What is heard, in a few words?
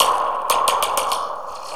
Spring, Acoustic Beats; Sonic Foundry (Sony Creative Software); Myths of Technology